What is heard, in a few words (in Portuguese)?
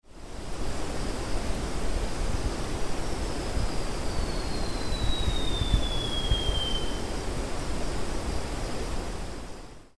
Oxyruncus cristatus Araponga do horto